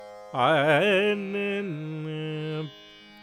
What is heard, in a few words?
Amrtavarshini Shruti G